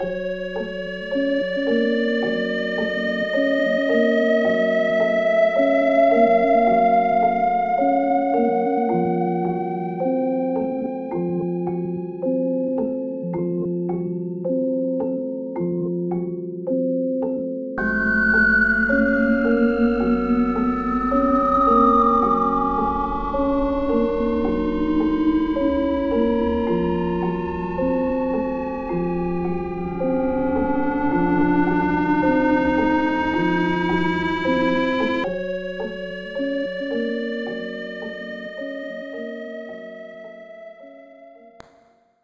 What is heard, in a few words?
Eerie; Among the Sleep